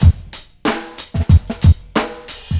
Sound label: Roland SR-JV80-12 Jazzman 92bpm